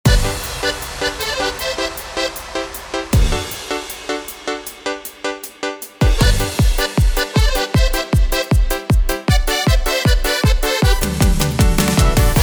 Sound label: Style Yamaha; yamaha genos